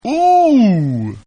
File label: Lady Says OOOOO; Exclamations; Voice